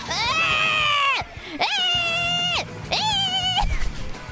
malding noises; IRyScream

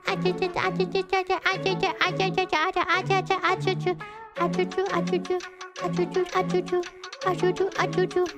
achachacha; noises

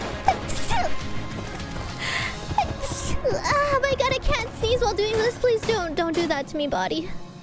Sneeze

